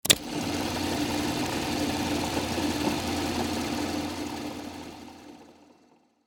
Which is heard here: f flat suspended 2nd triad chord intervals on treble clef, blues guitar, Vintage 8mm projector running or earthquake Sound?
Vintage 8mm projector running